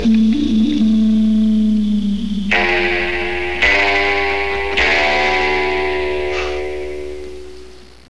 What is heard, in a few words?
waawaa; gunslinger